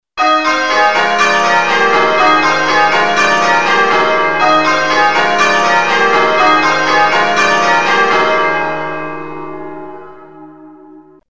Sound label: Queens, changeringing